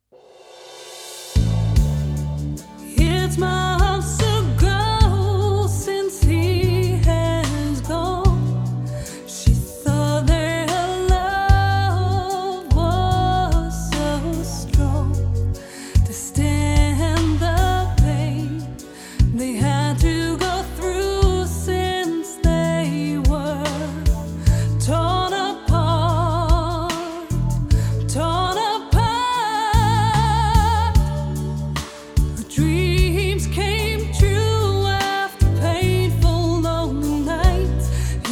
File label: Reverb Lexicon PCM 90